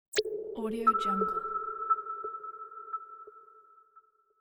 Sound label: drop sonar